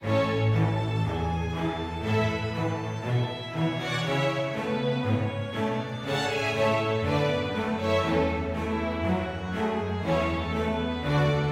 happy forte

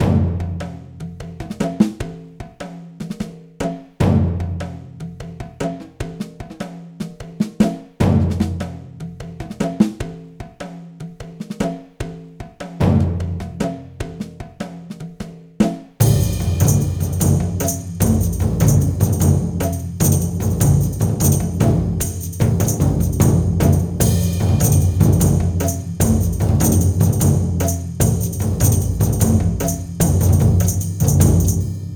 Music Song Of Fear Percussion; 7 Mages

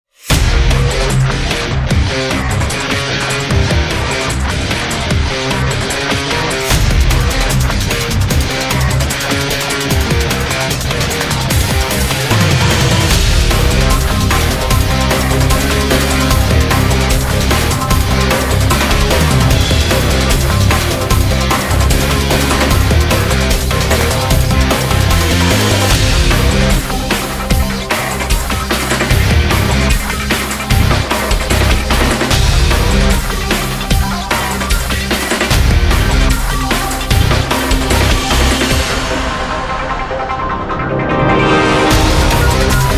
Combat Intro Space Rock; darkstar one; windows xbox 360

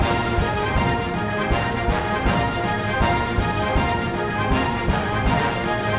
Music Segments Fury Theme Bpm160 Loop; asterix obelix slap them all 2

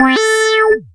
Text Feedback

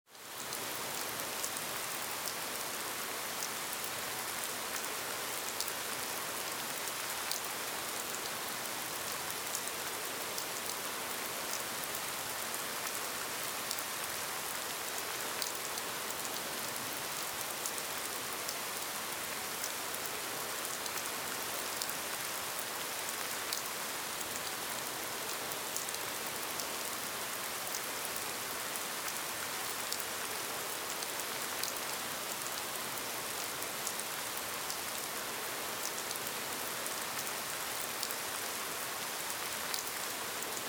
Cutscene Ambient Rain; Counter Side

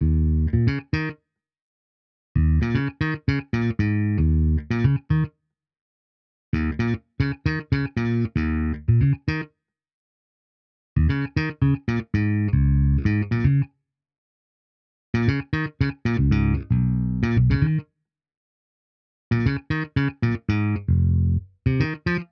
disco rhythm

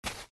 Sand Step

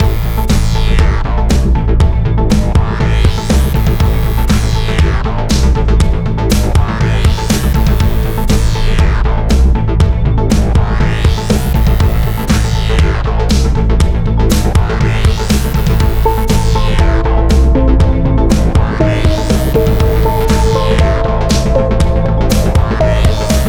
Bar music; mechs mercs black talons linux macos windows gamerip 2015

